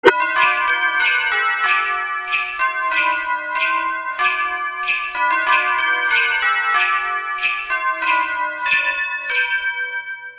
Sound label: Christmas Bells